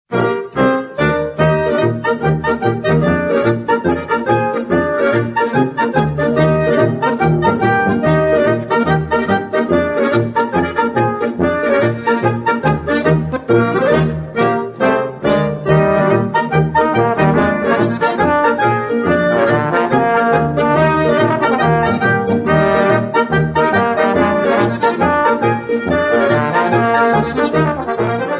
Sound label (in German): polka